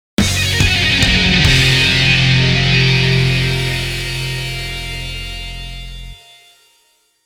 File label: Sword Sheate (game over); Akai Katana Arrange Compilation Zan Kata; Daisuke Matsumoto